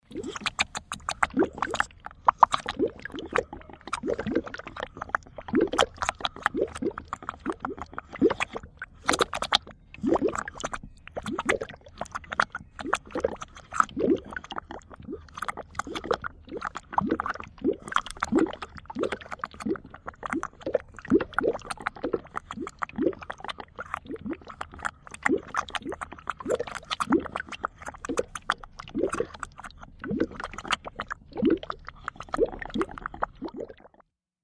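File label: Fast Viscous Bubbles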